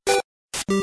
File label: tune